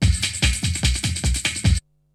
BREAKBEATS, TECHNO

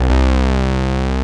SYN, Analog; Sound & Vision; Gigapack I CD 2

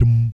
BS DMM, Vocal Planet Jazz & FX; ILIO; BASS DUMMS

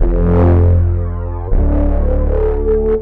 synth, Fmaj, Hardcore Breakbeat; Big Fish Audio; Hardcore Breakbeat Action

